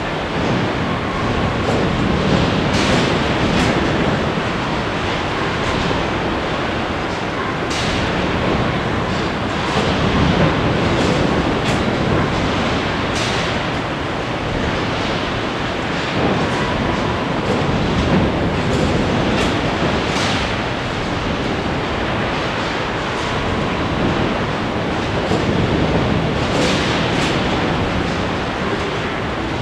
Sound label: ambience industrial